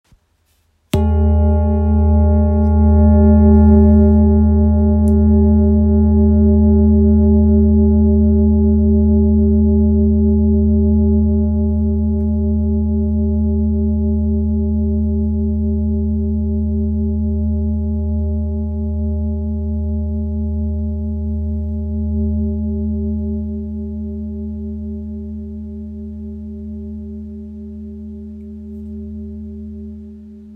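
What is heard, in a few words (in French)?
Bol ramgandi mi, bol chantant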